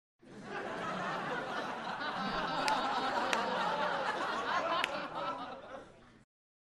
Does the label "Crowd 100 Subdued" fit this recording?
Yes